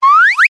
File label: cartoon slide whistle ascend climb very fast